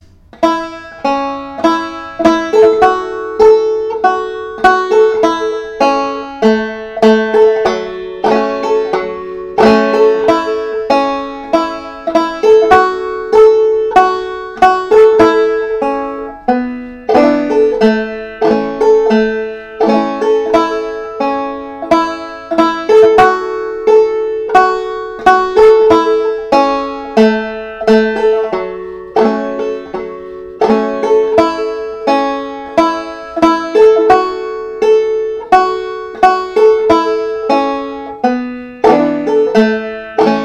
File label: banjo